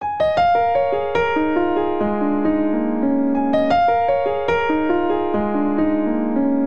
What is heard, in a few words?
Phrase Free Cinematic Descending 72 bpm, Grand Piano; MIDI Clips; Ableton; Factory Packs